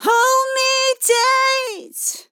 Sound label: LYRICS Fills 120bpm Fm